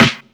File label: original snare single hit a sharp key